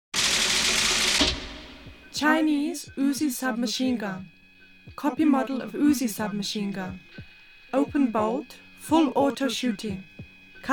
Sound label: Handgun, CHINESE UZI SMG; Ghost in the Shell; Project 2501 - The Real Image Collection; SE-3 (Handgun by TOGUSA)